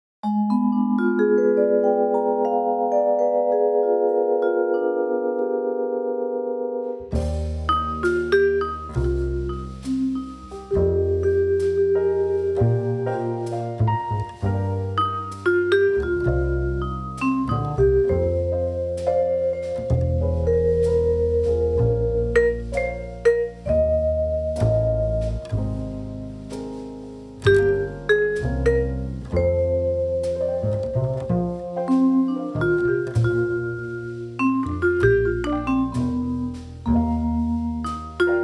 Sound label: vibraph